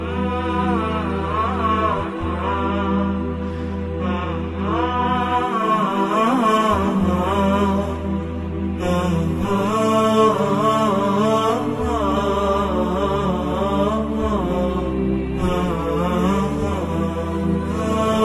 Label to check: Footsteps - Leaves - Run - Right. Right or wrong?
Wrong